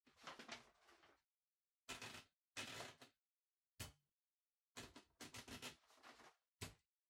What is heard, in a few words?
Typewriter Far Paper Insert